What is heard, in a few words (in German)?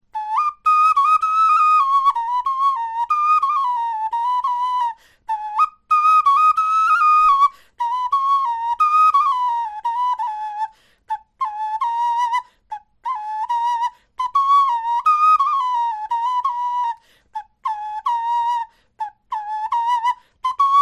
melodie, knochenfloeten